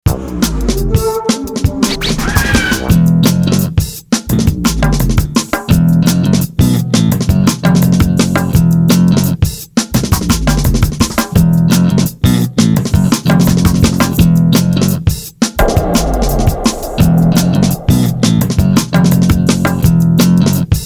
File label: Ambience; Lewa Ambience; The Legend of Mata Nui; Console Games; Game Music